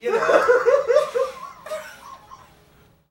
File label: laugh